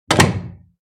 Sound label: Door close